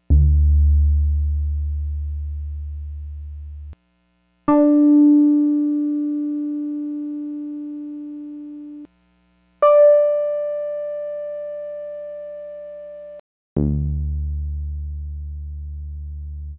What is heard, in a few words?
Electric Piano; CS80; Xils